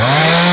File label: chainsaw attack start, weapons